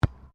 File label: SOCCER BALL BOUNCE ASPHALT; Soccer ball sounds; Sports